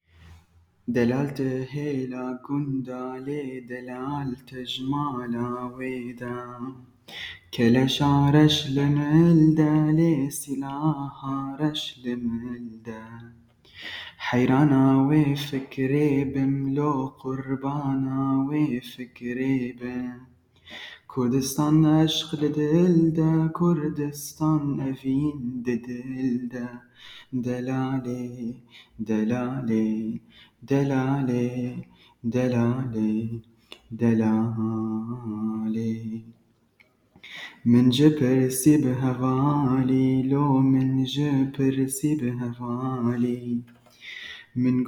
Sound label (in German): ein kurdisches Lied